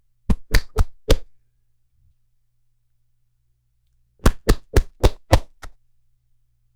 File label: sharp slap across the face in an argument; human interaction